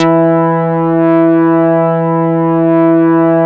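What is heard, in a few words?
E1 ANA BS; Synclavier Sampler Library; KEYS AND GUITARS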